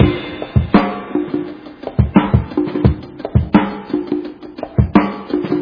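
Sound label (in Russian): барабанный луп тропический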